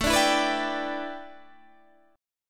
A 9th Suspended 4th Guitar Standard, chord; A9sus4; strummed; JGuitar

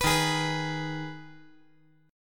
D sharp Suspended 4th Sharp 5th

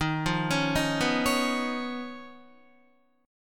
Eb 7th Suspended 2nd Sharp 5th Eb, Guitar; 11,8,11,8,11,8; Eb7sus2#5 arpeggiated; JGuitar

